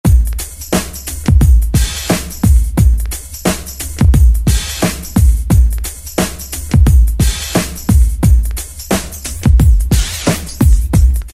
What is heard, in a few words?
Homeboy 88, Dance; Roland SR-JV80-06